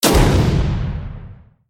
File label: explosion short